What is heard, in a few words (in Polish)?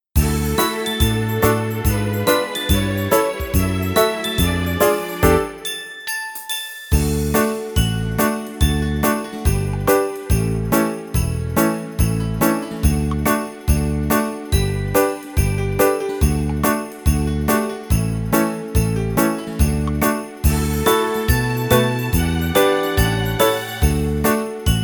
mikolaj stuka do drzwi instr